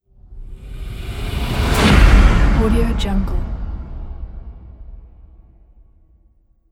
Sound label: big cinematic impact